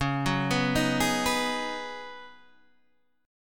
Db 7th Suspended 2nd Suspended 4th Db down Guitar Open D